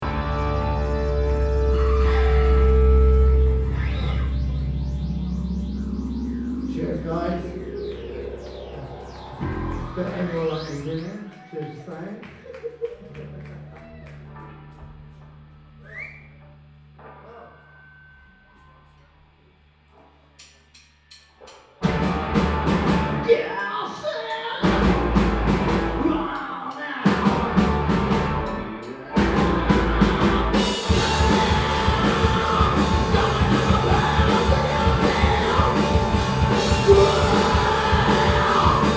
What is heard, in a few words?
ringtone